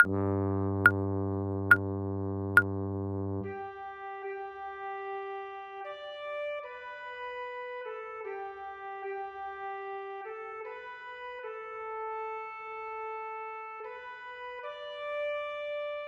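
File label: Chorale Organ Soprano No Exercise; classical ear training; Bach Chorale 1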